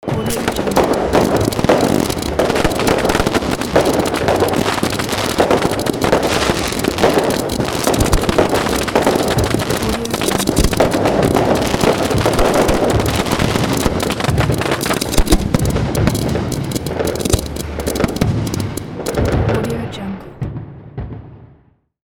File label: fireworks loud